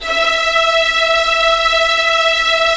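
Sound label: Strings Violins; Roland - LA Composer vol. 4; 90 s Sample CDs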